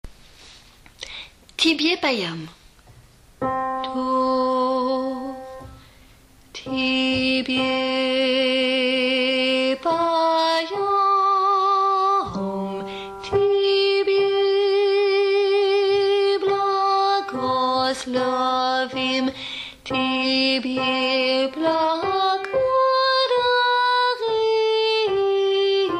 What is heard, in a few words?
tibie paiom, basses